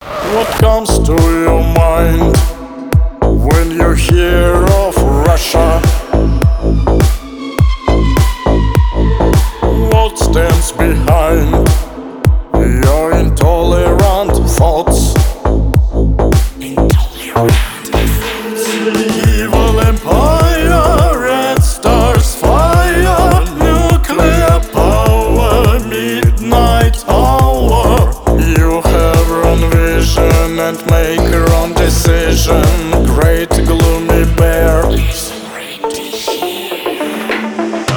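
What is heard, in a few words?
Strong Russian Accent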